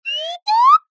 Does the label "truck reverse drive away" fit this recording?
No